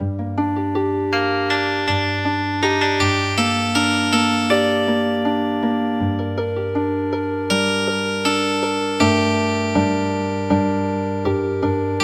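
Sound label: Healing Harp; The Nanbu Cow Driving Song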